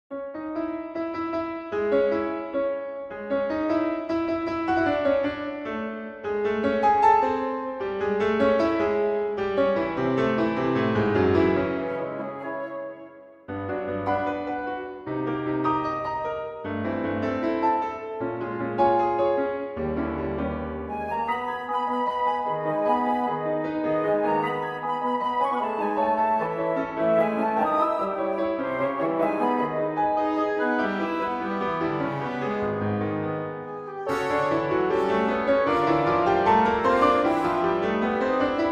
wo strings; Poulenc

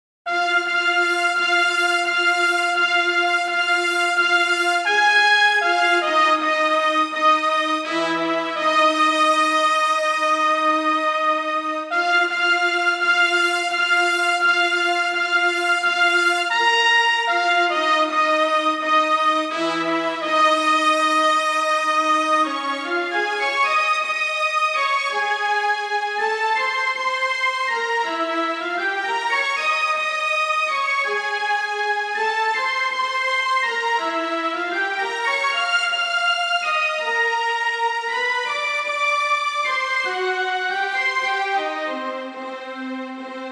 Melody

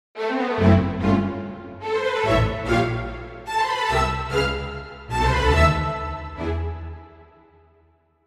Intro strings

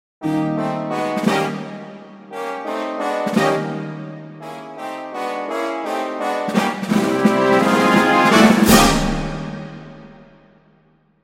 brass

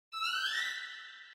run porta

